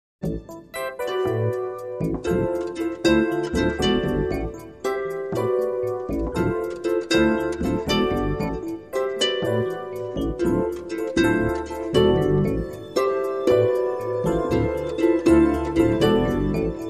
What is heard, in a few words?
Cosmic Jazz